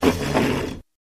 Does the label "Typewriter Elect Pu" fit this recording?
Yes